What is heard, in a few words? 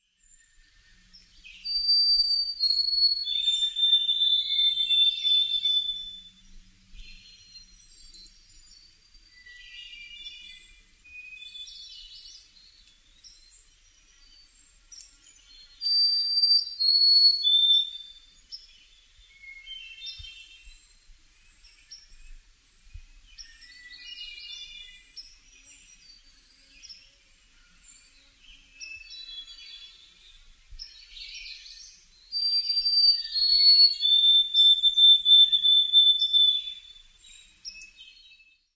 white throated sparrow